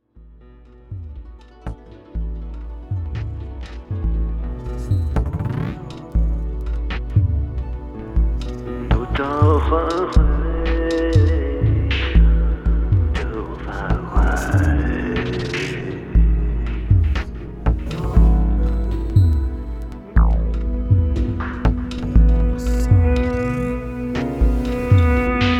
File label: pop song